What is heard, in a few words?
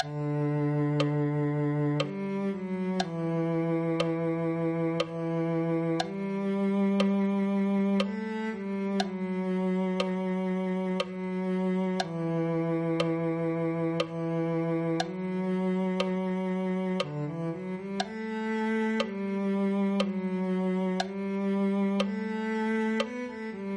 cello